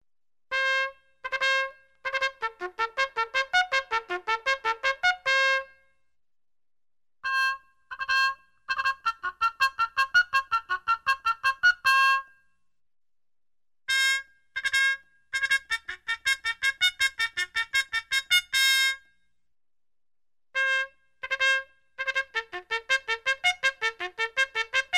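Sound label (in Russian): труба мелодия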